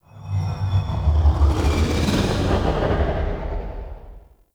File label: Exterior Passby; Ambience; Warframe; Corpus Railjack